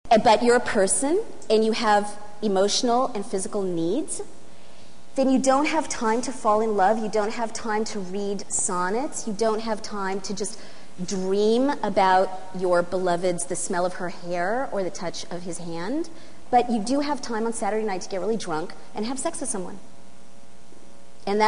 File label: wolf hook